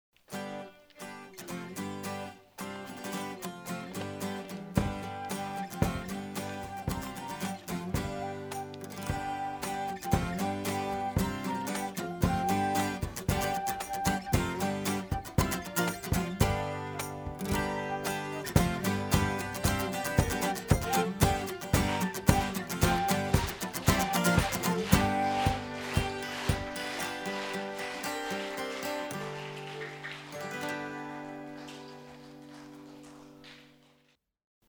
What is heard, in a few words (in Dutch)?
instrumentaal; Het Oneindige Verhaal in muziek; Kleine Keizerin